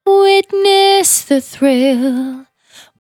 Finally 70bpm C, Velvet Vocal; Zero-G

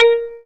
A#, Synclav FM PERCUSSION; Big Fish Audio

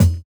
Kick, jungle breaks drum sounds

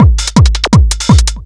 Speed Loop Kicks, Drums Beats

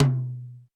Roland U220 Dry Set

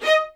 D, LOUD VIOLINS; Q Up Arts - Denny Jaeger Master Violin Library

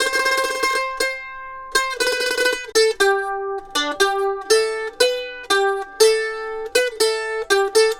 Mandolin Lead; Continental Drift Aftershocks

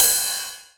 909 Ride, Drum Machines; DrumstationV1

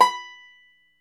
FLAT TOP, Flat Top Banjo

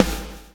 BLOW; Spectrasonics - Backbeat

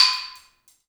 balambo, balafon; Heart of Africa

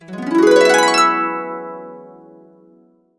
E, Harps

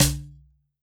drm, WORLD DRUMS

